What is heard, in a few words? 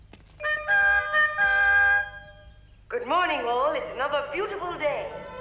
good morning alert chime